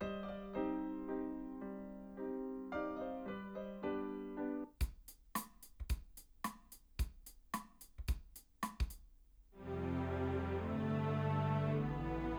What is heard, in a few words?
Retro Tube II omni